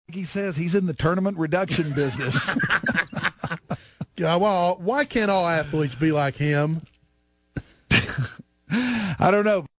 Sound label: Weird Noise; Full; Yeah Wall!!!